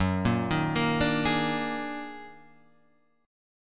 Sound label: D sharp Minor Major 11th F sharp